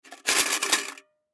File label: Tin Small Coins, Tin Full Of Coins Shake; Tin Full Of Coins Shake Light; Shaking; Foley; Objects; Blastwave